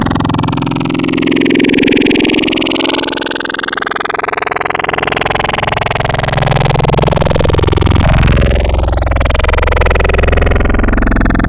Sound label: growl, virus wavetables; Secret Growl; Cymatics; SERUM